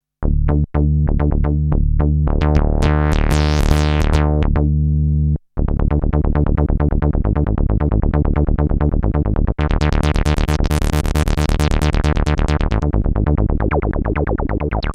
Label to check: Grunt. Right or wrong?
Wrong